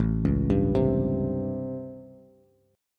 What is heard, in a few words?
A 7th Sharp 9th A, Bass; arpeggiated; JGuitar; 5,4,5,5